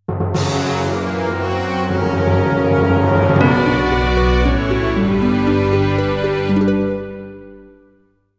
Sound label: Scenario Not Clear Jingle; Fighting Spirit Vanishing Towards the Abyss